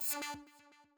STING SHOOT

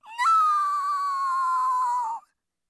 Character falling down from a building

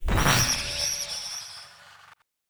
Shifter Die

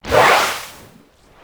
Death monster

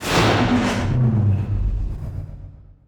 Worm Burrow

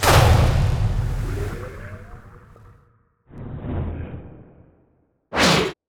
Dome Driller Settle

